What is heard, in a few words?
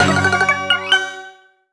Clear Jingle; mario luigi bowser s inside story bowser jr. s journey 2018 nintendo 3ds